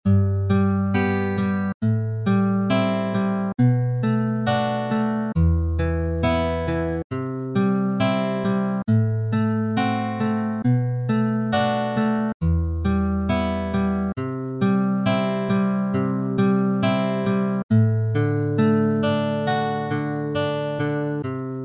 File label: acoustic